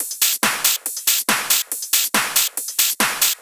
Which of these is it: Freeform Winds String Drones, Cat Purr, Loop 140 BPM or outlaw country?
Loop 140 BPM